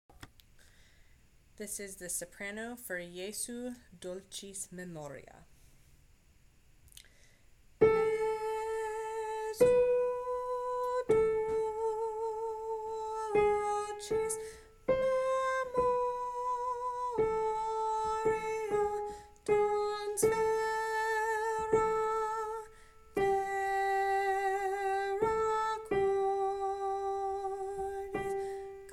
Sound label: Jesu Dulcis Memoria soprano